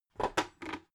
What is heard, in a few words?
bucket handle pick up